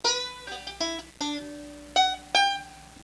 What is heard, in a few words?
shave, banjo